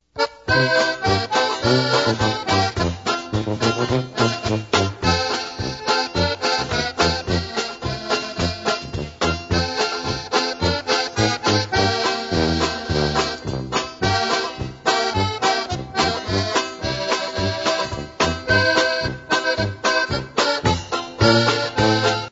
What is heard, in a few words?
DANCE HALL POLKA